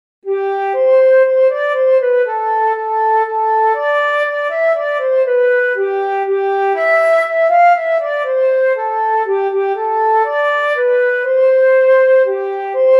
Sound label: we wish you a merry christmas flute